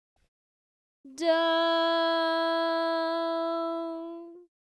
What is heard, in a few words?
sung tonic; Melody 3